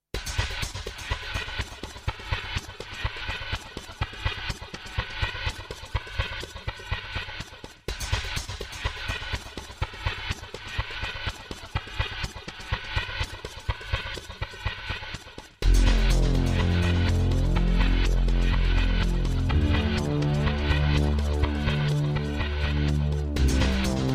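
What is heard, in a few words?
instrumental, Ukrainian rock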